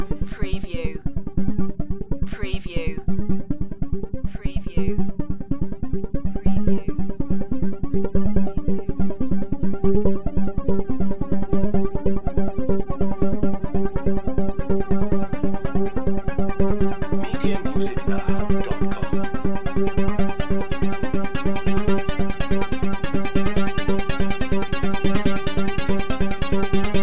Techno-Trance Synth Arpeggio Loop JX8P in G 142 BPM